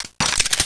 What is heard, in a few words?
de clip in, weapons; svencoop